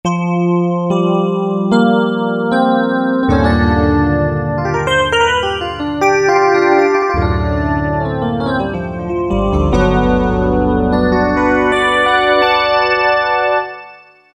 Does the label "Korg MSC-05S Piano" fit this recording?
Yes